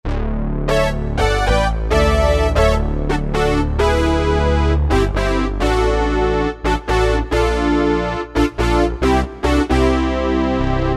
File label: Synth Brass, Korg M1; Factory preset examples